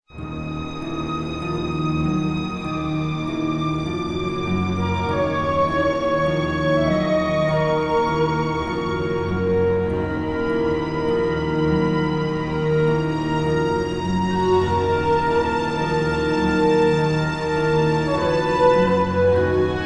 Tavern; heroes of might magic v gamerip 2006; Dungeon

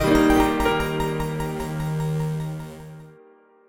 Startup

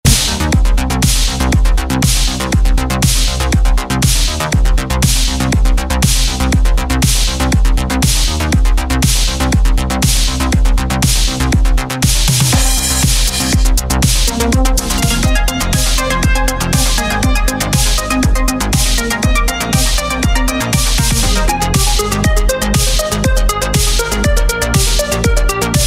Dub

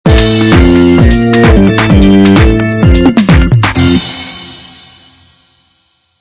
Lose Game; Happy Feet Two; NDS

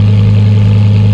Nissan Skyline R34 Low

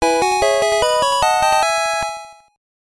Pokemon Received Fanfare; Pokemon Yellow; GB

